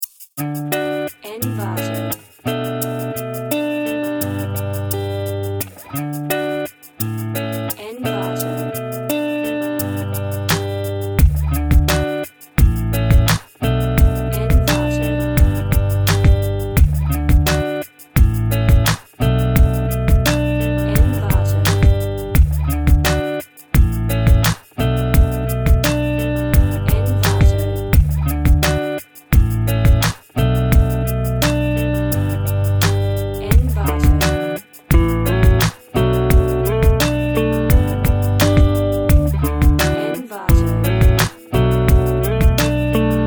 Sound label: Blue Loop